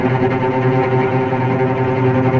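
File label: cello C3 tremolo; East West; The Ultimate String Collection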